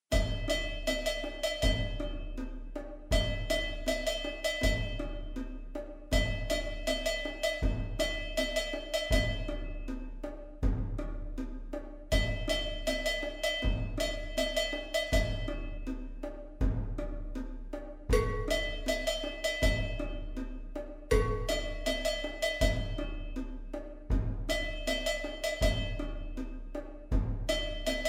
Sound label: Dvorak Serenade RHYTHM Training Andante con moto; Clarinet